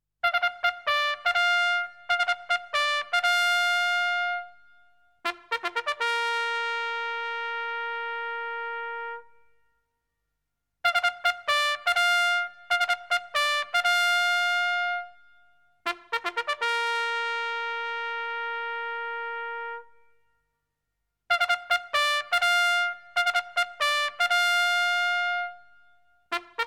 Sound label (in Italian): TROMBA MILITARE